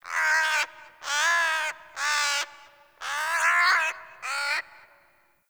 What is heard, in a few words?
tree critters; Warframe; Eidolon Plains; Ambience